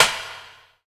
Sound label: A#3 HOLOW, JAPANI PERC; Northstar; The Wizard VOL-1